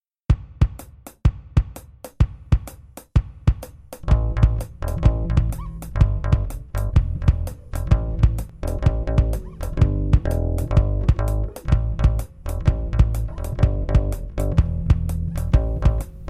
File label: Jig